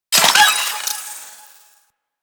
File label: Loot Container Break B; Sentient